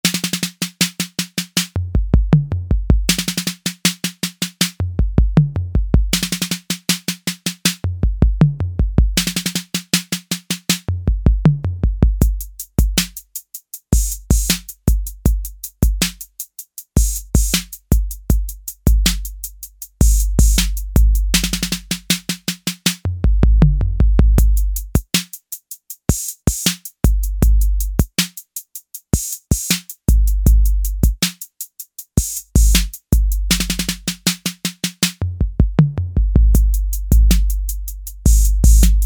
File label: Fill in, Roland TR-808; Factory